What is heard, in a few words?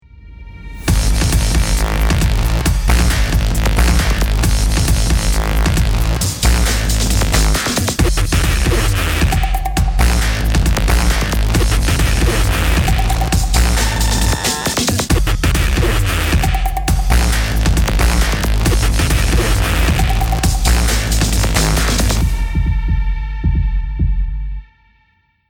start action, music